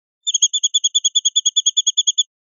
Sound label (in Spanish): rep tiriteos, pardillo